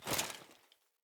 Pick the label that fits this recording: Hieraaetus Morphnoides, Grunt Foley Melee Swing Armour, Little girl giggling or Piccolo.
Grunt Foley Melee Swing Armour